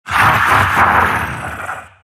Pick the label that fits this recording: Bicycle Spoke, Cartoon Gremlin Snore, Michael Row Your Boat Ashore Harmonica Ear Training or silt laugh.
silt laugh